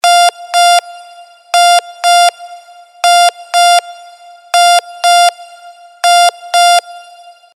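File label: alarm clock signal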